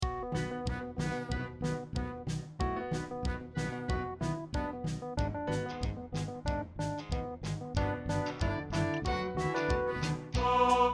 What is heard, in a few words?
Tenor Baritone, rehearsal